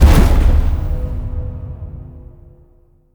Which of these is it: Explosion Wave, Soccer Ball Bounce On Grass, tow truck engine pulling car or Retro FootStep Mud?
Explosion Wave